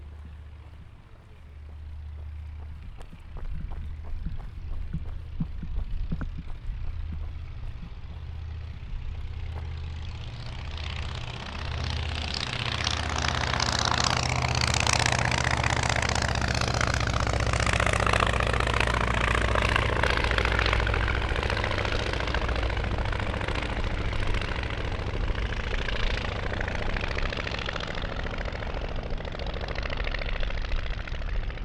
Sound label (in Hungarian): felszálló repülő, csattogó hangú, repülő egymotoros; budaorsi repter